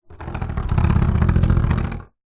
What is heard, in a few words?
musical keyboard synthesizer fingers run along keys turned off